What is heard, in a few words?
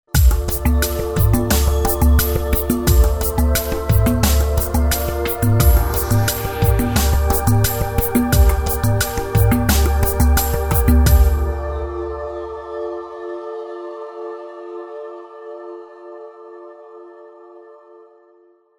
jungle jingle